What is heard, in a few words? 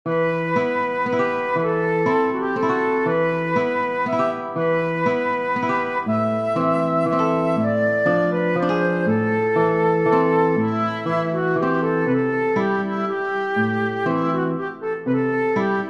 waltz for flute guitar; Lyric Pieces